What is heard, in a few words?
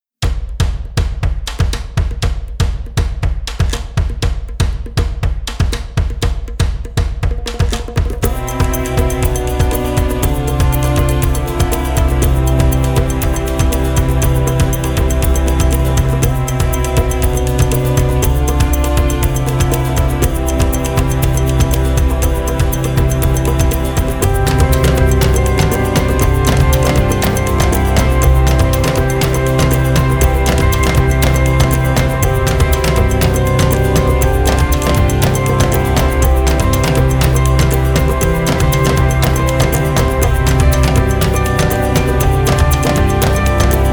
Pop Rock